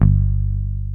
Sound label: PICK BASS